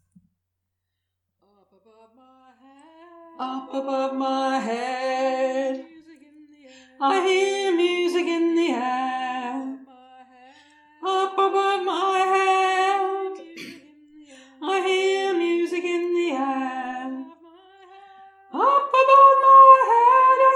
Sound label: up above my head bass